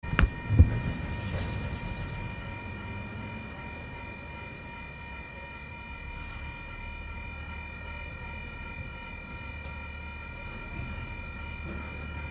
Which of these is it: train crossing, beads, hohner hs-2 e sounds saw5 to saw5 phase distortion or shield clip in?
train crossing